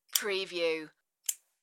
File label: Lid Lighter